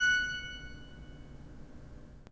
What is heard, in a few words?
PIPE, 3DOrgans